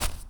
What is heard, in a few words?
NPC Hit